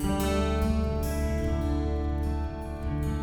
Picking G

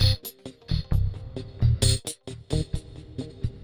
Funky 125-132 Cm7

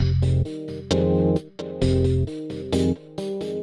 Funky 125-132 Fm7 9; Loops

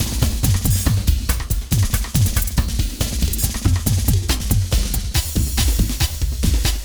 Wild Ride 140

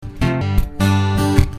Punchy F,G

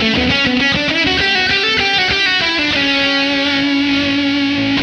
lead, 100

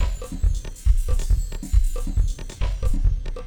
Jazzy Moods 138

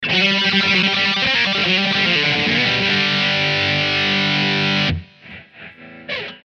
Outro Speed Rock G

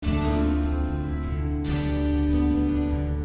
Picking G 74

